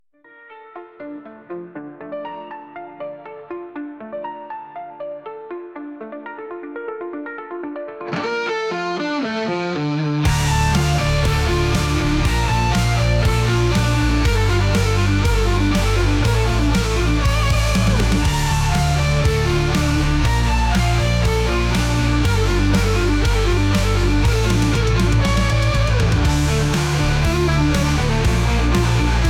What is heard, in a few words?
Interlude